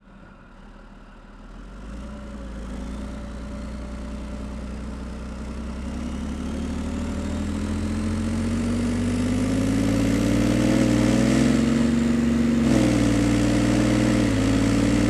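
Strv 121, onboard, FAST, Drive Various, EXHAUST, Leopard 2A4; 1989; Center; DPA4062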